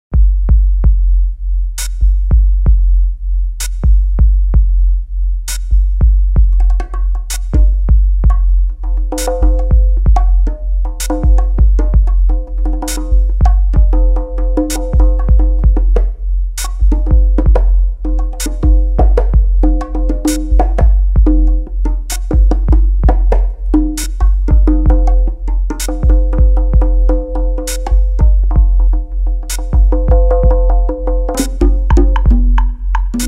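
Bongos, Conga Pad LP, Metallpercussion, Bells, Rainmaker, Shekere, Clave, Sampler; Modern Trad. Cuba; Timeline: 2 / 3 Rumba C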